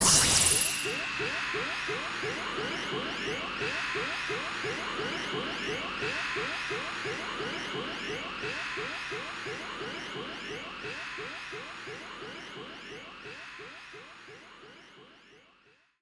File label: Satellite Ball Loop; disney star smash android ios mobile gamerip 2020